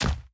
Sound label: footstep; Smite; god Khepri